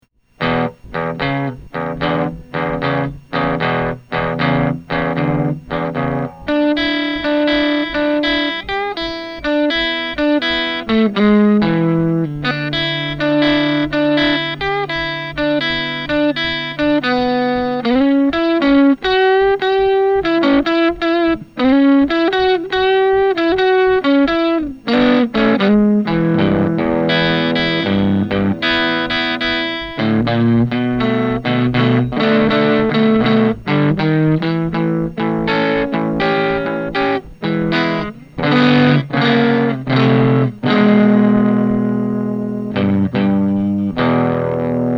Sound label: jc12-50fa blues; jensentone